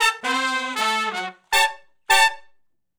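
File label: R&B Riff (A) unison, harmony; Sections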